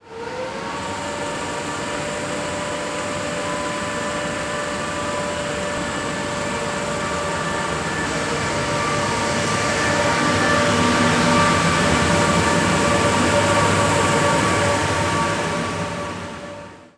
class 87; treacher rail; simutrans; Pak128.Britain-Ex

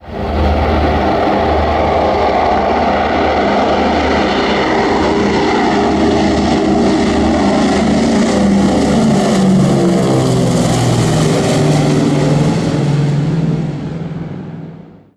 constellation; xonstellation; simutrans; Pak128.Britain-Ex

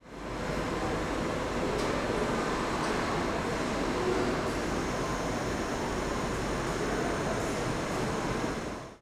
car factory modern; Simutrans; Pak128.Britain-Ex

